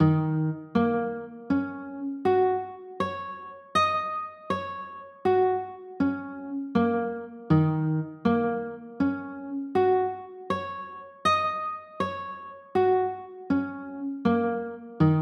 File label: e flat m7, chords